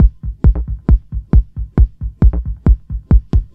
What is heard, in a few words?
Drums Techno